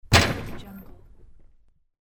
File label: basketball hits backboard